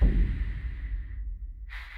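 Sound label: Music Volcano Light 120; from dust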